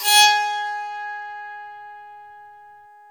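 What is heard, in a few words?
bowed psaltery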